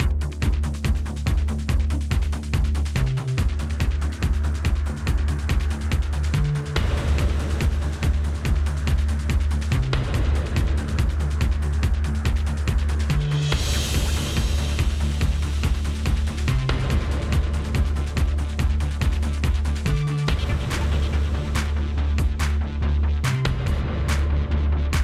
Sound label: High Alert; zet zillions